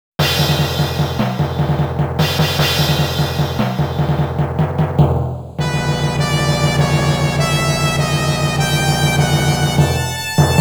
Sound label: Boss Theme; TAITO SOUND BULLETS (2025)